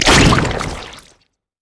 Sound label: bounce splat; LoL; zac base